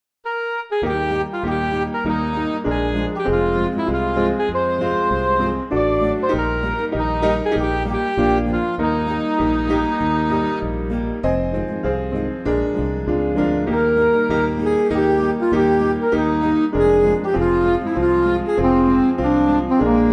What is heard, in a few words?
Music Backing Files for Churches; Oh Hes Coming; Benson